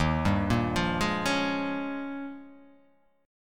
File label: D sharp 11th D sharp